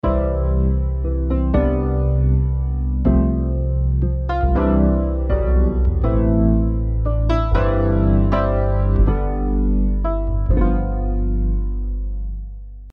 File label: Pigments Emotional Piano ROTARY